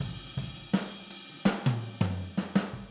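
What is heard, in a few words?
Boogaloo Fill Overhead stereo, 82 BPM; Sonic Foundry; Drums from the Big Room The Mixes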